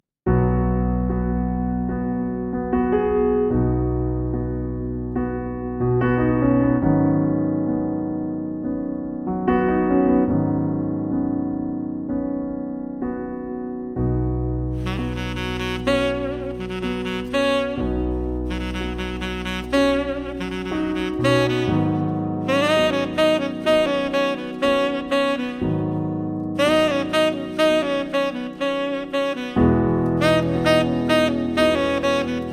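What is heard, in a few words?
sax, Contemporary & Jazz Saxophonist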